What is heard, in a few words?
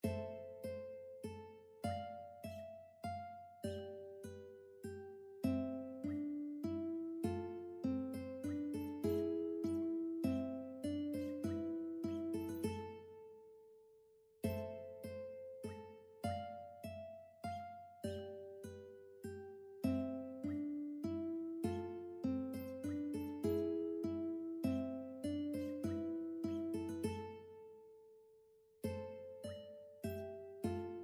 duet ukulele minuet; Minuet by Johann Krieger; classical ukulele fingerpicking duets